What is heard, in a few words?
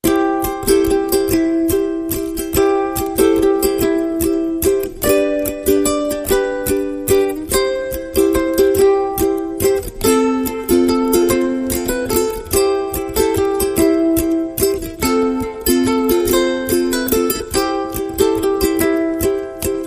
Silent Night Ukulele